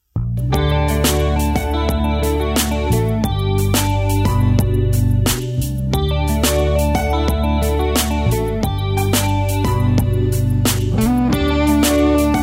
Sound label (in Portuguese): trilhas para filmes tristeza